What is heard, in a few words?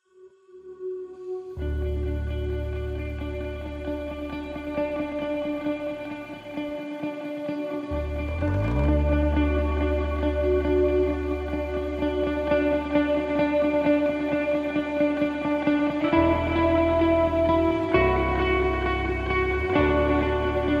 No Acoustic Guitar Mix